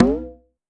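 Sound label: Conga Hi Up, Drum Machines Clavia DDrum-AT Pitch Envelope; 300 Drum Machines; Pitch Env Various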